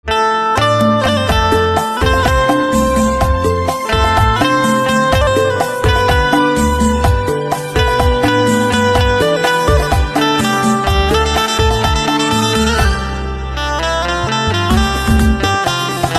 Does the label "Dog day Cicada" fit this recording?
No